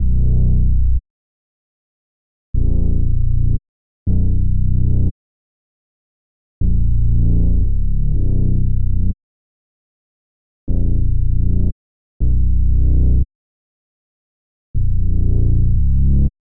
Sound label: 118 BPM B Min Bass; Melody; Trap; Loop Stems; Cymatics; 2022 Melody Collection; Trap Pt 1